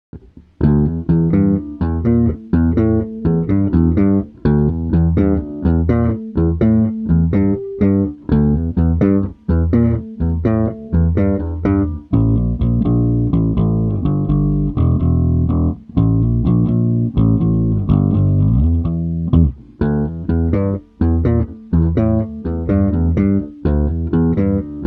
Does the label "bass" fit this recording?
Yes